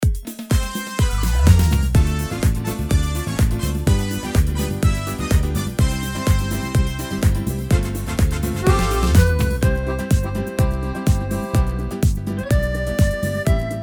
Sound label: Disco Samba; AiR Symphony